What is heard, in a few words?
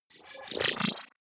wobble hover swell medium; energie